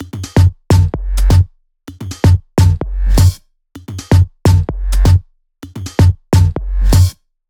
Melo 128BPM; Mini Kits

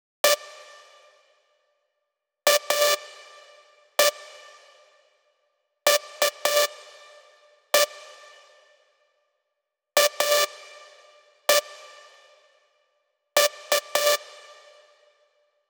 128BPM Lead Root D; Uno; Vengeance Samples; Uno Kit

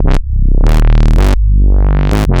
Roland A Line, Roland S100; musicradar-synth-samples

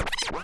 back spin short, vinyl record

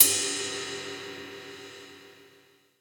Ride, Cymbals; Vengeance Samples

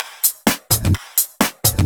Electro Loop 128BPM; Vengeance Samples

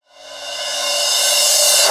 Reverse Cymbal; Vengeance Samples; VEH1 Cymbals

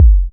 Kicks; Vengeance Samples

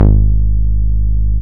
Bass Long G#; Vengeance Samples; Long Basses

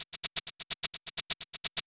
Cabasa; Rap; 128 BPM; Vengeance Samples; Vocal-Hook Kits; Song Kit

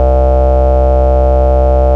Ultimate Bass Root C; Vengeance Samples

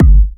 Soft Kicks; Vengeance Samples

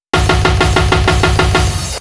Fill 128 BPM; Vengeance Samples; VEH1 Loops

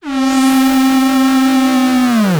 Roland D Line, Roland S100

